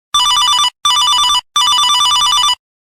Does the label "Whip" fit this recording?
No